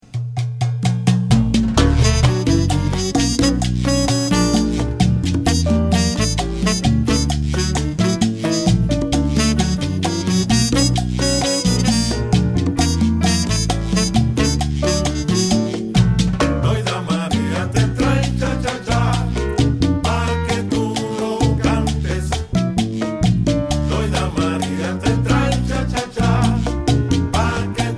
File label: cha cha cha